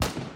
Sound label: shoot